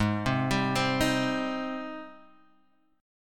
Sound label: G sharp Major 7th G sharp